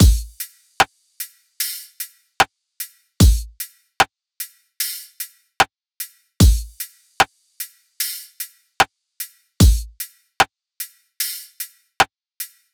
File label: Full Drum Loop, 150 BPM, Hip Hop; Drums; Humble Hip Hop Sample Pack